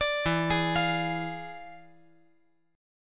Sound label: E 7th Flat 9th, chord